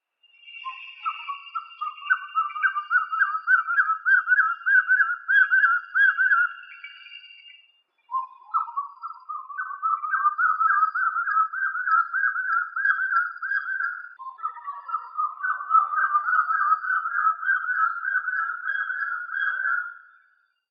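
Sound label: Lyre-tailed Nightjar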